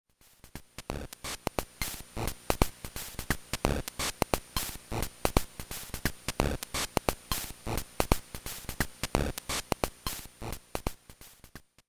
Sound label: swing